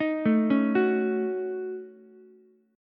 Eb Minor 7th Flat 5th, down, Ukulele; arpeggiated; chord; JGuitar

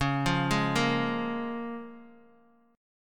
Db 6th, Guitar, Open D

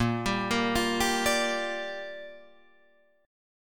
A sharp 6th, Guitar Drop D

